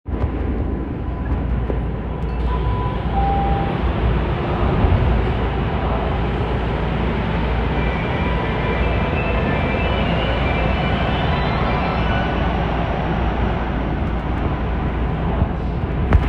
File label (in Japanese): 副都心線氷川台北, train sounds